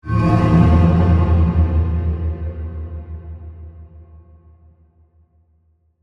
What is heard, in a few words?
Digital Gong; Sound Design; BlastWave